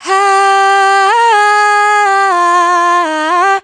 riff Ah Huh Run Cm, Vocal, Dry Vocals; Raw Vocals; Slate Digital Omnivox Vocal Sample Pack

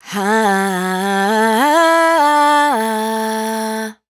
riff Inspo Dm, Raw Vocals, Dry Vocals; Slate Digital Omnivox Vocal Sample Pack

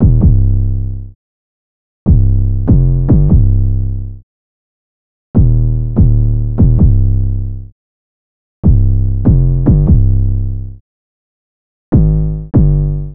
808 loop 146 Cm; Slate Digital BASSHOLE Sample Pack

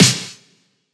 snare slam; Drum One Shots; Slate; SD MIDNIGHT Sample Pack

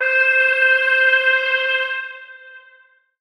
tonal oneshot C; Pop; Slate; PASADENA Pop Sample Pack; Tonal One Shots

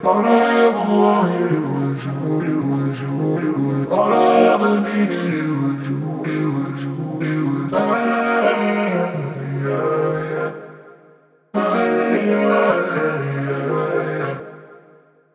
songstarter 125 Bbm; Slate; SD PASADENA Pop Sample Pack

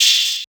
hat degraded, Open Hat; Hip-Hop; Drum One Shots; SD CAICOS Hip-Hop Sample Pack; Slate

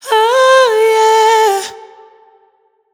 riff oh yeah Dm wet, Vocal; Slate Digital Omnivox Vocal Sample Pack

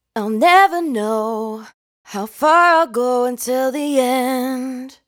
Dry Vocals hook Never Know 120 Am; Raw Vocals; Slate Digital Omnivox Vocal Sample Pack

